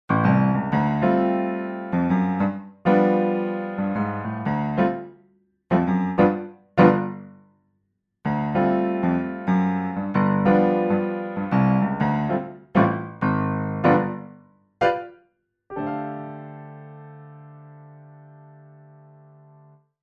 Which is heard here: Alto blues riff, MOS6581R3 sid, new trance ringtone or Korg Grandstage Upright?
Korg Grandstage Upright